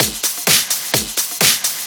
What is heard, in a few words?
Straight Loop 128 BPM, House